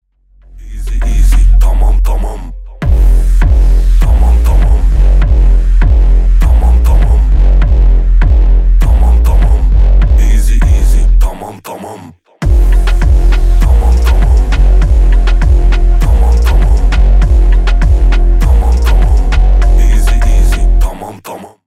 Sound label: bass